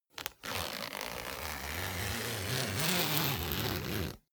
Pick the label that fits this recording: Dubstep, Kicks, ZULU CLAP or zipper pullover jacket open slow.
zipper pullover jacket open slow